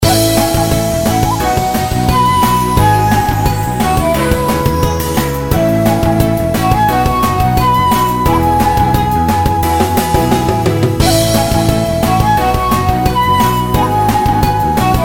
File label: Raid; Mist Train Girls